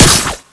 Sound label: minigun, guns; minigun-1